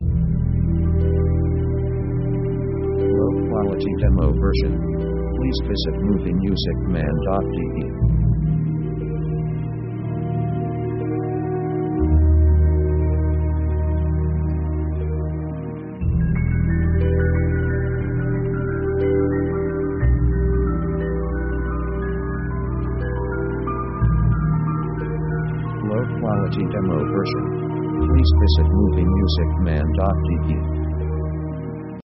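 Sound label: orchestral magic strange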